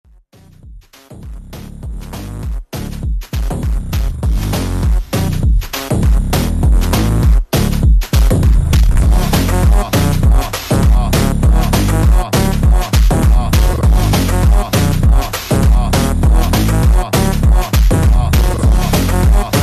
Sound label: super Slowed